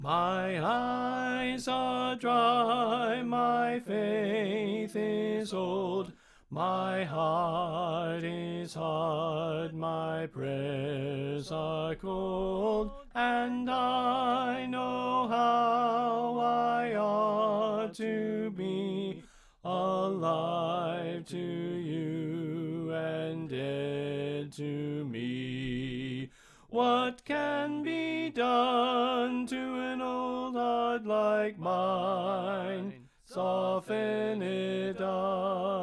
Soprano Left

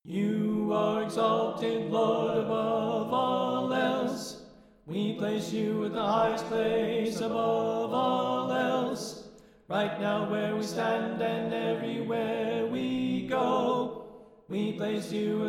Tenor Left

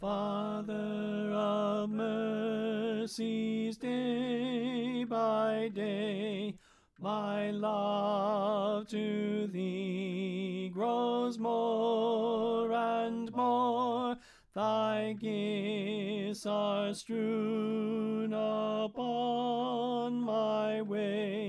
Soprano Left

